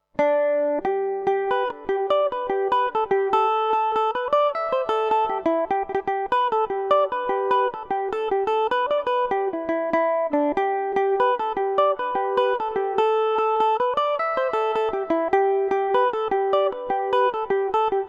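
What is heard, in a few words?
G major revelation, Irish mandolin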